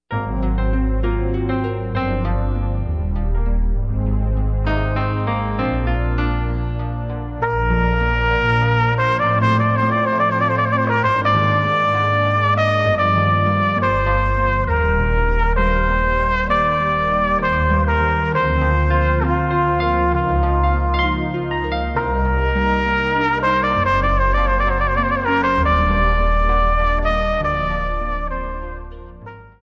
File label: Romantic Trumpet Voluntary; traditional wedding songs